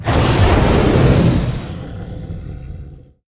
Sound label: taunt, dragon; combat; creatures; CosmicRage